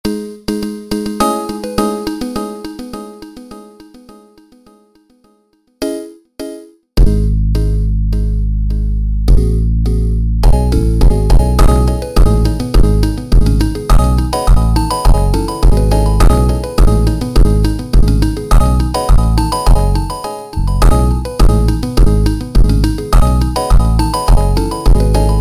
Funk instrumental Slowed

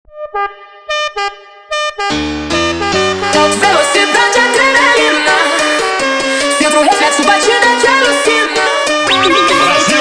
slowed Reverb, funk

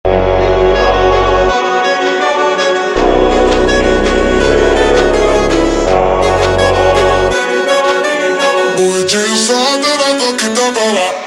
Funk Risada ultra Slowed

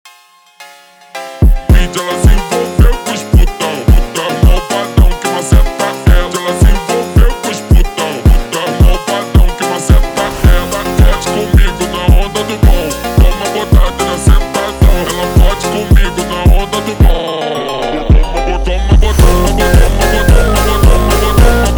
slowed, funk

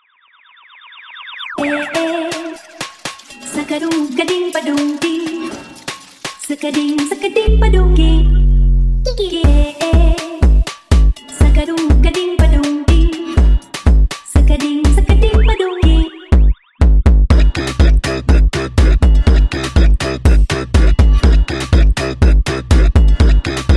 Automotivo sped Up

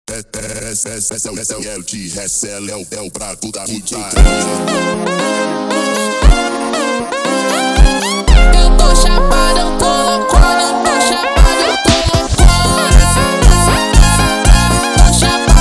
funk slowed

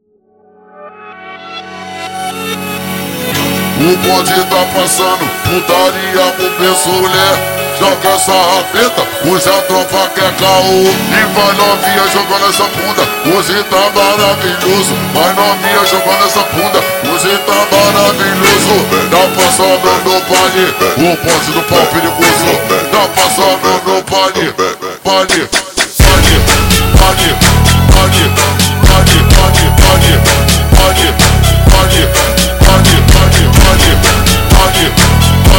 super Slowed, funk

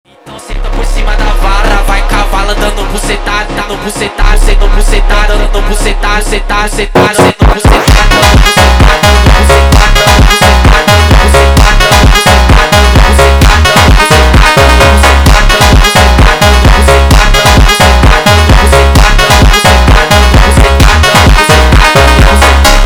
phonk music

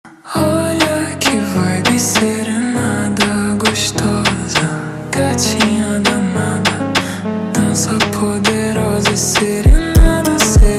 super Slowed, funk

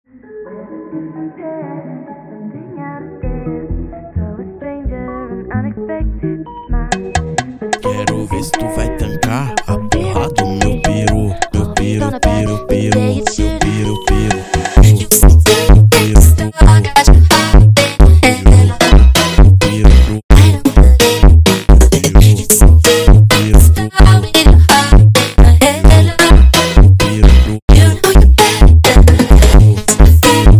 Funk

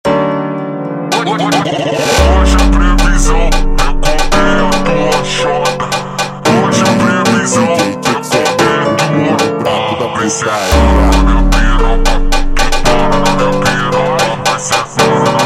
Melancholy Funk slowed Version

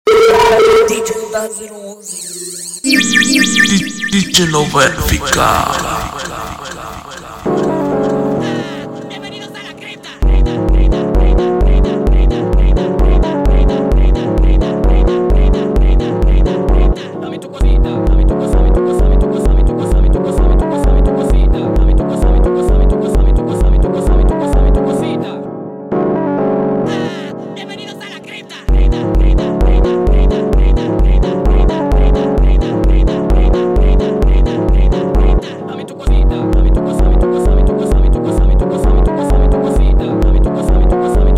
Funk rhodes

